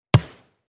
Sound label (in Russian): moshhnyj udar; Звуки футбола; Звуки игры в футбол